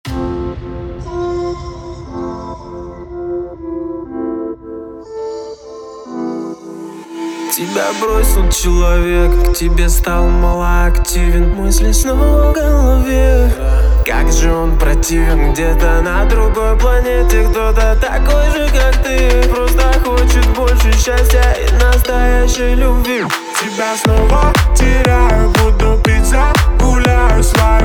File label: groove